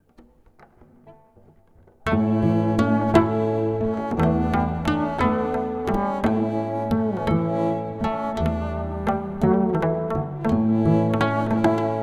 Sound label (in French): violoncelle